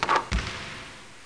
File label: Swish; Florida State Football - Ultimate Multimedia Experience